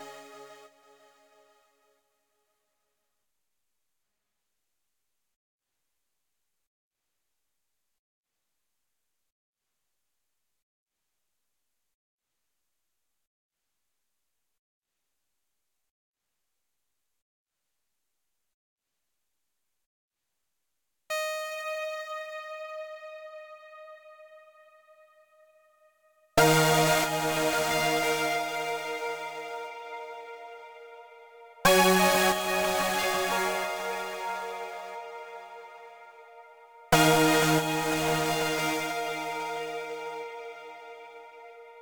Synthwave 91bpm Music Menu; Hot Lava

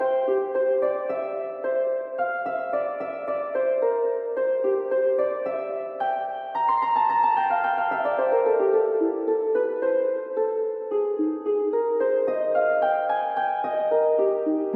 harp; Toki Tori 2+; Toki Tori Collection

